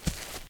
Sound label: fs grass, singlesteps